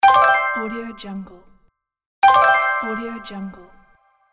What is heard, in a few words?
small reward